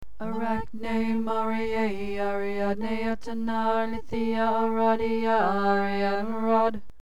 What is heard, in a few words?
Spinning Chant; Chants